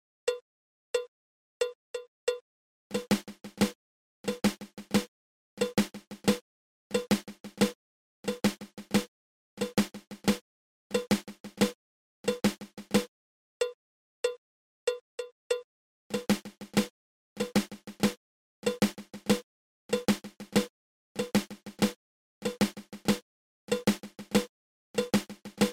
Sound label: Flamacue